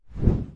zoom out

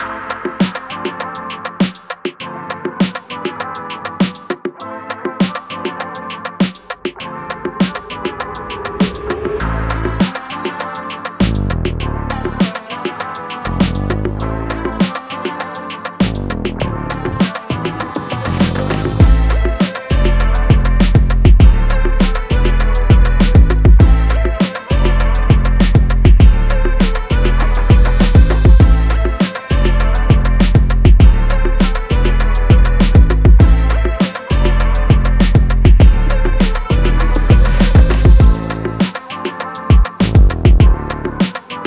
rnb

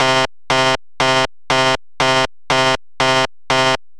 critical Message

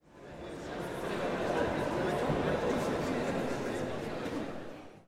crowd Chatter theater; spellbound

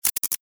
navigate

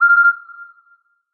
Alert

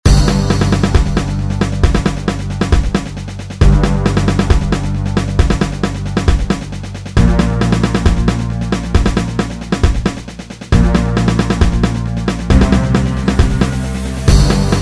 soundtrack; battleship

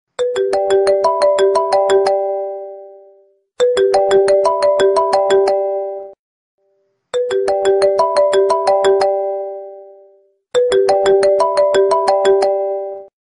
ringtone; Whatsapp

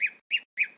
bird

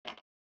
type; zara

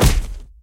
punch

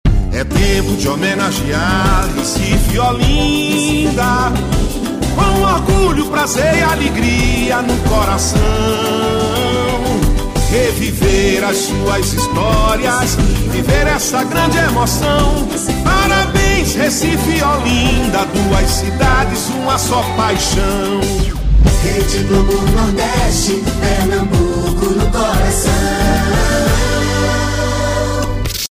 Jingles

